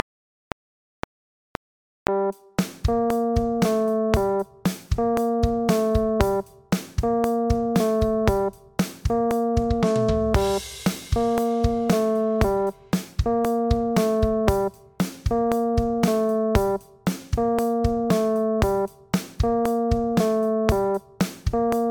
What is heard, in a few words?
Ant Chat Audio Instrumental; insect communities